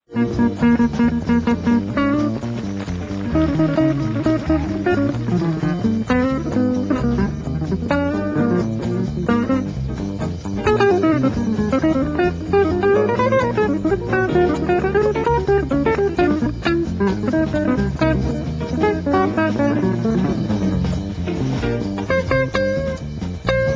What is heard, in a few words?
bugle low